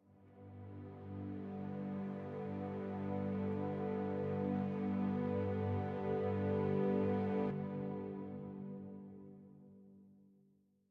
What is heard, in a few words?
music interactive; World of Tanks